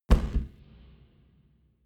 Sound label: gun pop gun pop